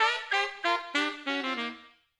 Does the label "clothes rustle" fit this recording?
No